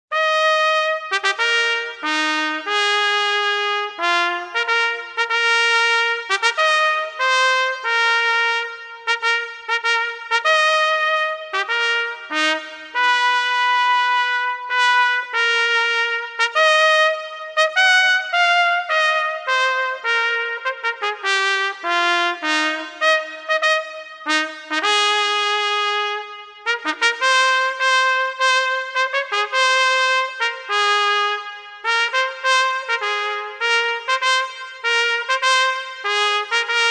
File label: Solo Trumpet